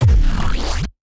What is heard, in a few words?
Low Cinematic Impact